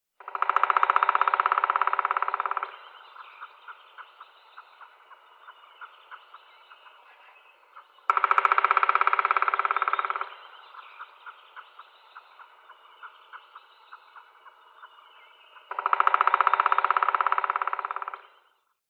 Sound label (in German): schwarzspecht dryocopus martius, tierstimmen; wildtiere